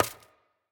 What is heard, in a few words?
staff ground; smite; ahpuch skin10